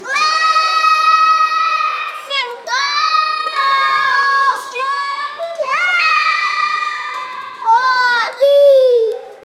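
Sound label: human whistling child girl; whistle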